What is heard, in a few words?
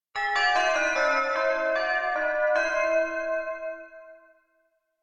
elec bell kakakakankan; anemoi